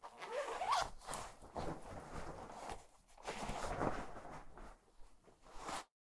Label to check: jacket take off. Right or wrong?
Right